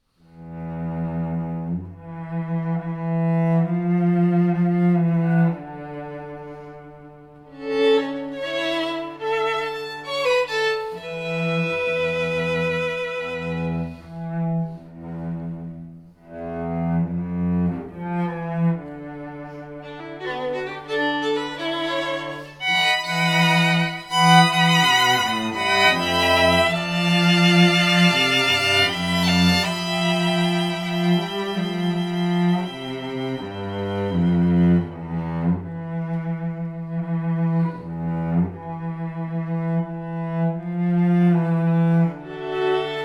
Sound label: String Quartet